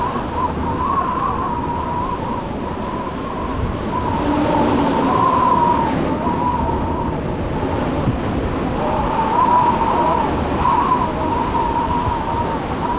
wind wave

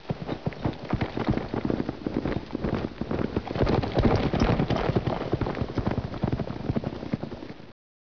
western distant gallop; ambience

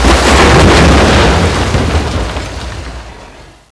crashing, ambience

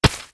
hit, snowball

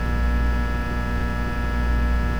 power transformer

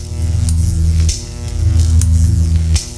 electrical

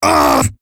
death; player

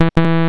game error; zelda

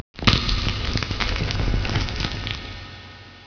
crack; rebar

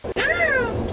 cook

